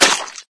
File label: flesh squishy impact hard